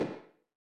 space Migration Step; HeavenStudio; flock Step

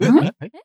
huh audience; HeavenStudio; ringside

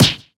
pot hit; HeavenStudio; karateman

